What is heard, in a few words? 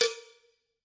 Cowbell Double; Idiophones; Struck Idiophones; Cowbells